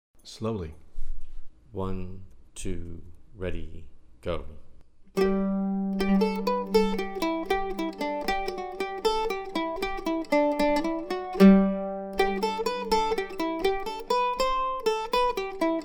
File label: Clare Jig slow